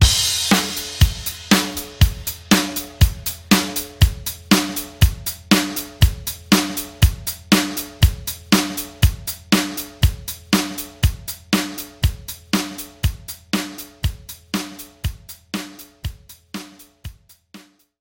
Result Drum; Bubble Bobble Sugar Dungeons

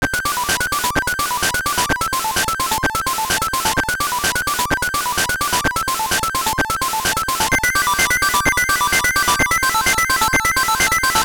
Pause Menu; Pokémon Trading Card Game 2 The Invasion of Team GR; GB

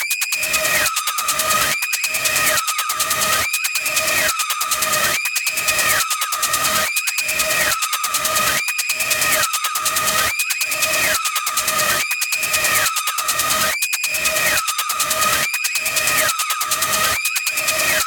Biome 3 Idris Shootextra Loop; Voidbreaker; ps5 windows xbox series xs

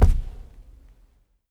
Cartoon Land

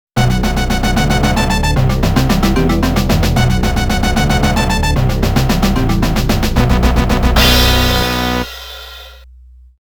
ROUND CLEAR; TAITO SOUND BULLETS (2025)

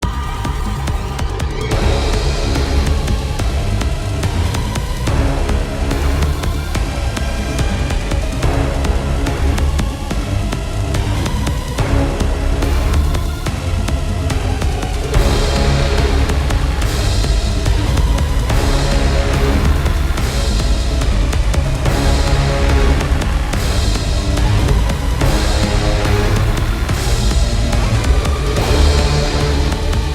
Tension

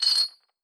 Chainmove

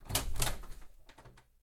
Open Door; MiSide

